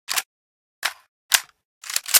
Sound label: sig550 reload, weapons